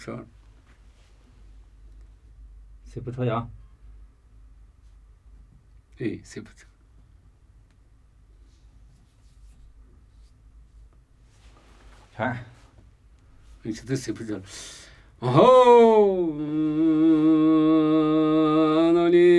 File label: Namuyi Tibetan Ritual Chanting; Mbo kho bu su